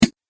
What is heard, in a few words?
Poison Dart Deflected By Armor; Miscellaneous Combat Sound Effects